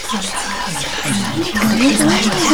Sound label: NOISE, CLASSIC VOX; Best Service; Hallelujah